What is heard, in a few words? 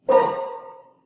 piano